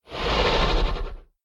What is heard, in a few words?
idle, horse zombie; mob; Minecraft